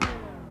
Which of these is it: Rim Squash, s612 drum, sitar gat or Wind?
Rim Squash